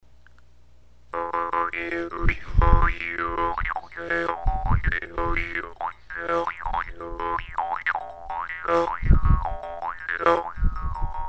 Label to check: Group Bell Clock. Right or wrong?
Wrong